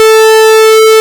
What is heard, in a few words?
J60 PWM A4; E-MU Formula 4000 Series Vol. 2